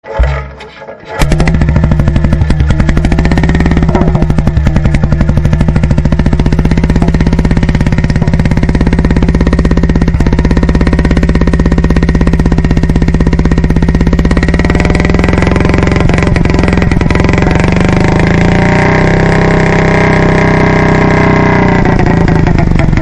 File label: peugeot p108